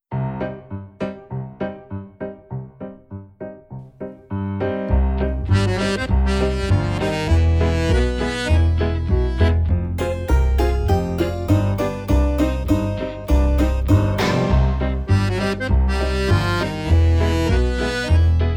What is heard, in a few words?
piano polka